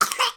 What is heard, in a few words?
human baby 9 months cough; sound effects one